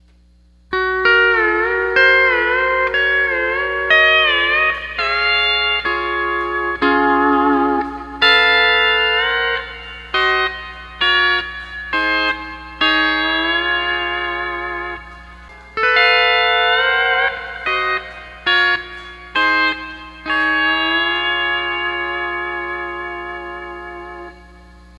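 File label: Slow, steel guitar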